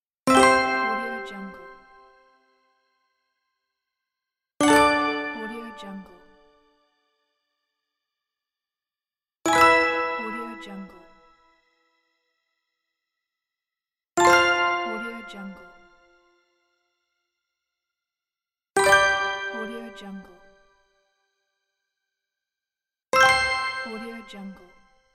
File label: success